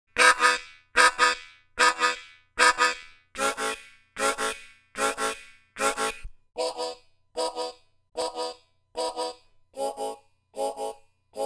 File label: Harmonica